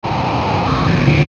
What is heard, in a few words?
Ascend Static Digital Filter; Blastwave